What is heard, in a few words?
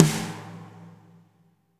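reverb snare left; Spectrasonics; Burning Grooves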